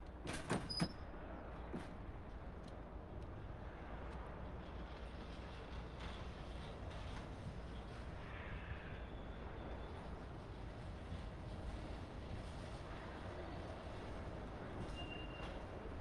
port railroad yard cargo train tank car passing idle shunting squeal slow close; fvtt sonniss gdc audio